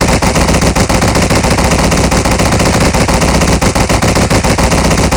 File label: AGS17 R, Weapons; DCS